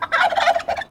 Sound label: Turkey Gobble